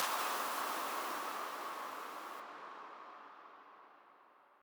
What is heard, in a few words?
impact